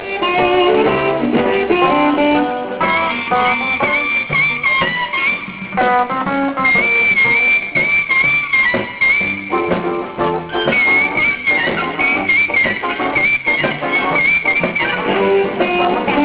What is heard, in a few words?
reading blues